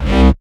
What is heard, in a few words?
Basses Root C